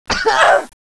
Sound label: Female stomach hit